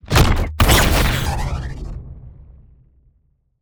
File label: Sci-Fi Grenade Explosion